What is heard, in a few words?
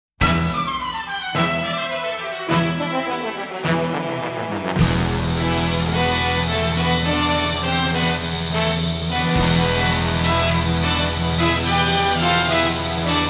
fast tempo; Neon Genesis Evangelion; Evangelion S2 Works